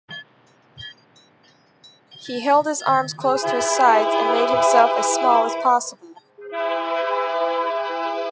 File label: railroad omlsa